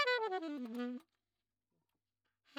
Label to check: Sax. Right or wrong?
Right